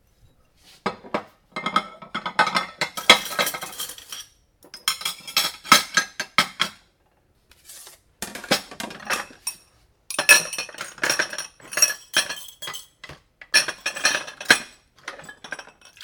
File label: Preparing Dishes